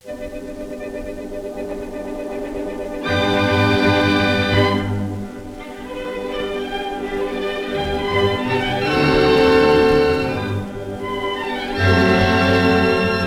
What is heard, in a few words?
thumps